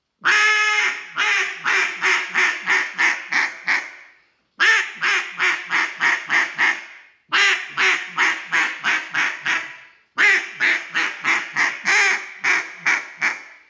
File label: Duck AXE Acrylic